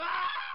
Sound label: scream; earthworm-jim